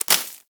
Bullet Impact Debris Grass; Warframe; Weapons; Bullet Impacts

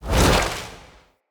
Casting Device Cast Dual

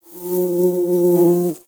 Exterior Foreground Bug Flyby; Warframe; Duviri; Ambience

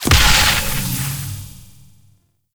Corruption Heal Burst; Enemies; Abilities; Warframe; Zariman; Void Angel

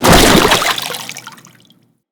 Fall Water, Foley; Characters; Tenno

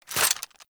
Shotgun Reload; Warframe; Tenno; Alchemist

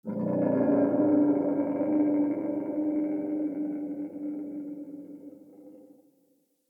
Forest Open Glade Creature Far, Day

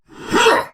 Attack Effort; en; Warframe; Battle; Dax; DaxKnight

